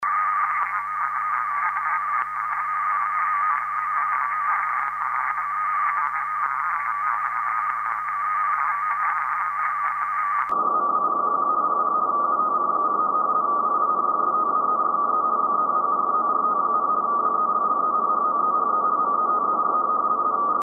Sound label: DWD 147.3khz